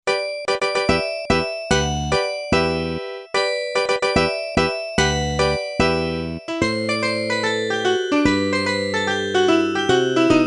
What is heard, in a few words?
Nutcracker